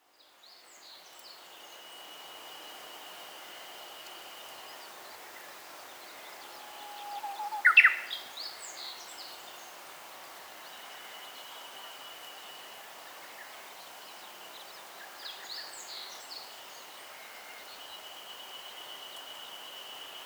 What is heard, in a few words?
bird